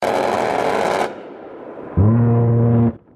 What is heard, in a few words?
GAU-8 AVENGER